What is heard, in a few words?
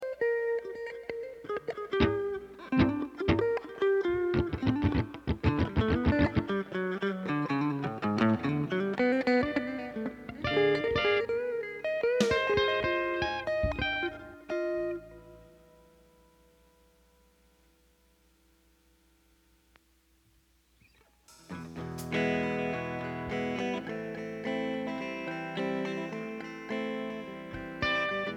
Expensive Drum Samples Mix